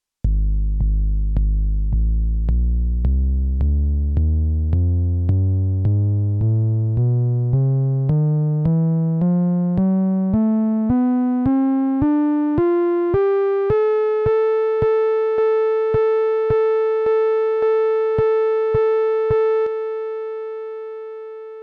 Volca kick pitch test

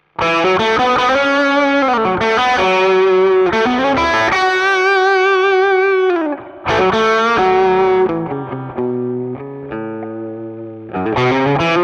Zoom G2X FOUR Basic Crunch

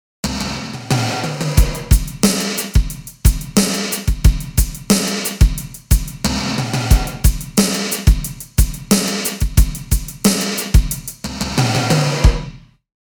80s Doomverb Kit; Nektar Aura

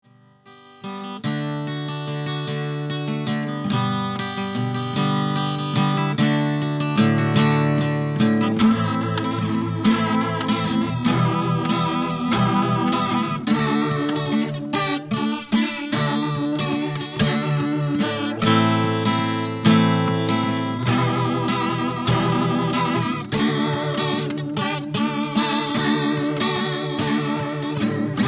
Guitar Vibrato seasick; Boss CE-1

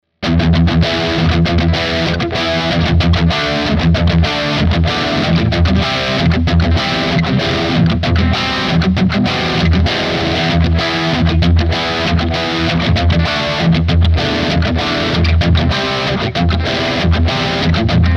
Fender AM Orig. 60 Bridge Highgain Rhythm

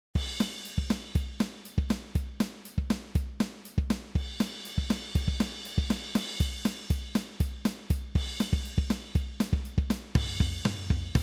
Drummer Punk Pattern Stereo Out; MOOER

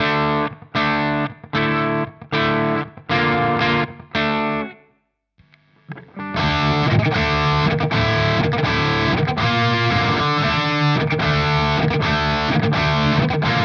Vox Valvenergy Cutting Edge

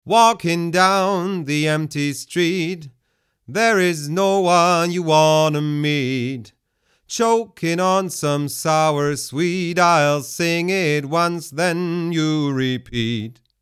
Male Vocal; Tegeler Audio Vocal Leveler 500; ohne VL 500

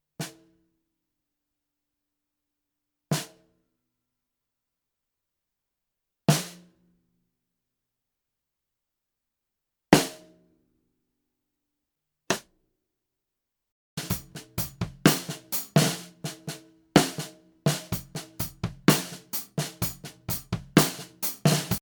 TAMA LAL145 low

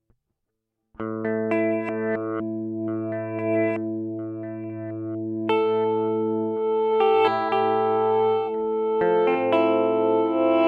Particle Reverse Freeze

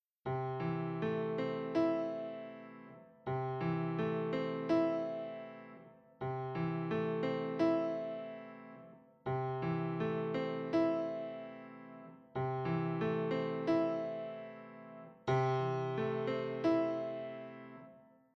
Qunexus log vel 75-95

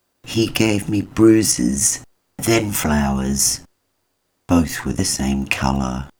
Vox Lower

